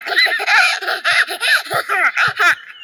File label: cute giggle